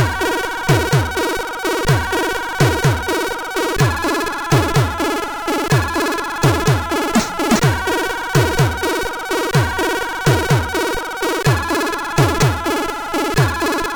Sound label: Music Sample, sid CSG8580R5